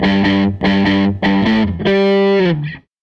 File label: Les Guitar Loop